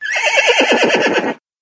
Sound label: npc horse